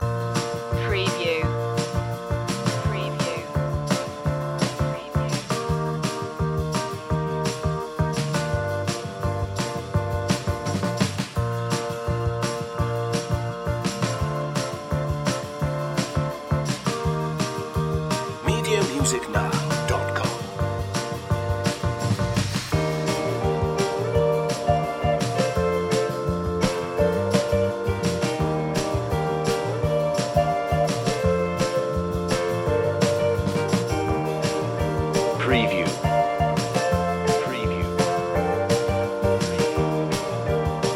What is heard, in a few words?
summer ride loop